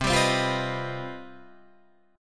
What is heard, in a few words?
C Minor Major 11th C down Guitar Drop D